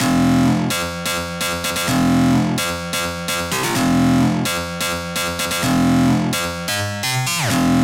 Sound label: Charged Synth Loop